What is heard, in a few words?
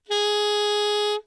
A sharp 5 alt, saxophone tenor